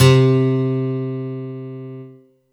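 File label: Kawai K5000W Steel Guitar 1 C3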